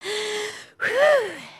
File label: panic, emotes